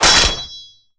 Sword

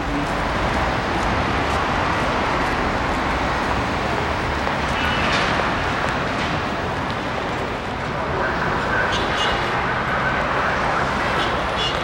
City, bgs

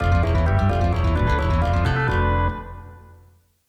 Curse

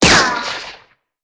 Gun

